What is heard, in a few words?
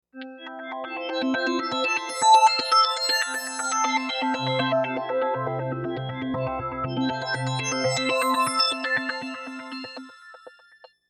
Gritter Pad